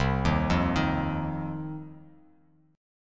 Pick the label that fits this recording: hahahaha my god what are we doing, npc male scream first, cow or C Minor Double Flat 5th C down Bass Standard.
C Minor Double Flat 5th C down Bass Standard